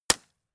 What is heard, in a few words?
gun shot silencer close; schusswaffen